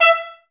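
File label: brass trumpets E; Miroslav Vitous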